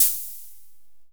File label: 501 HHO, Fricke MFB 501